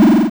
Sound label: WARNING; FLEETCOM; bfxr